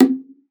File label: Bongos; Best Service ProSamples vol.42 - Session Instruments